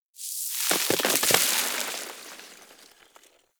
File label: Grenade Debris Snow; Venus; Venus Space Man; Venus Grenades; Venus Grenade Debris Layers